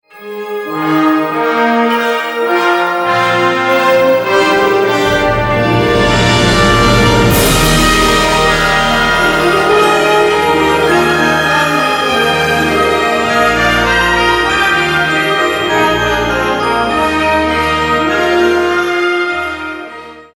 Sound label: Orchestral